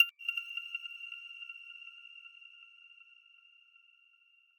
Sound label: arp